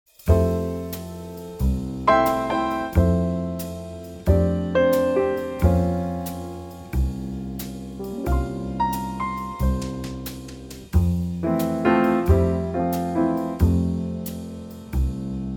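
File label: F, Backing Tracks for Male Vocals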